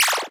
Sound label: shoot; supercraft